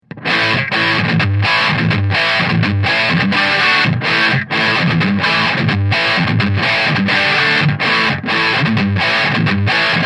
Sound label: Bias Distortion Heavy